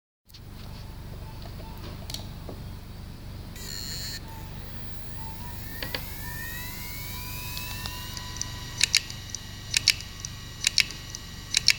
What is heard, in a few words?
Heads seagate barracuda 7200, damaged hdd sounds